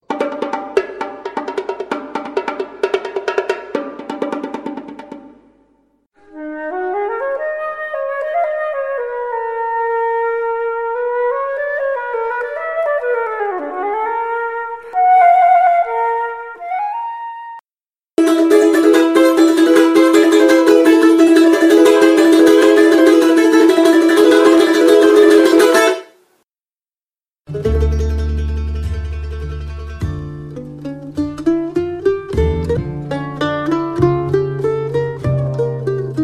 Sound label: instruments